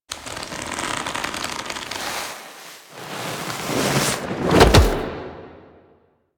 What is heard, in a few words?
Hand Slip Grab Foley; Cinematics; Tenno; Operator; Warframe; The New War; Throne Room